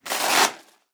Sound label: Blood Pressure; Medical; Blastwave